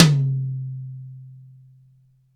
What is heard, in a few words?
MIX TOM H, STUDIO TOM